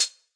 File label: classic hip hop H; STYLE KITS (Hip Hop, Etc); Classic Hip Hop Mega Kit